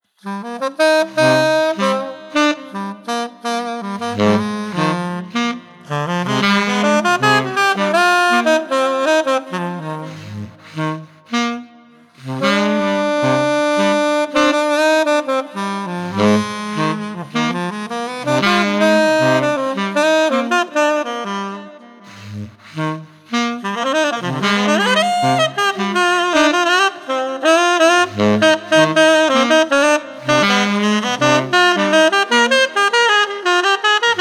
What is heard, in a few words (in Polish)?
Saksofon jazz